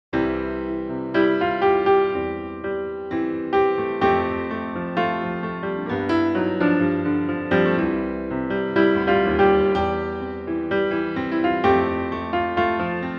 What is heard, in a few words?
piano